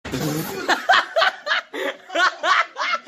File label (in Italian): risata